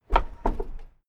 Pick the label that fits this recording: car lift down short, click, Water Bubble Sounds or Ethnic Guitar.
car lift down short